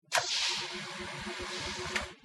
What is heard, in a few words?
doors; ten forward